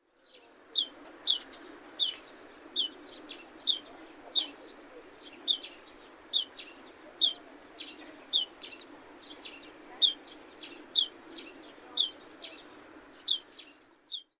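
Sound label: Eurasian Tree Sparrow chick, saitama; suzume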